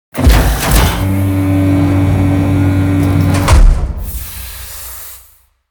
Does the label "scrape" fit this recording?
Yes